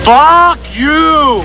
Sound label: Scream